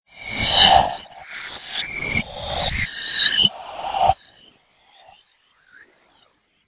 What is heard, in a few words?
Stinger; STINGERS